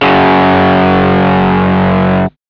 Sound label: GUI DIS F, E DISTORTION, Metal Mix; Sound & Vision; Gigapack I CD 2